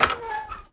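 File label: chest open; The Elder Scrolls III Morrowind GOTY; XBOX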